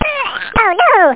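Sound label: lemmings ship explosion; lemmingoids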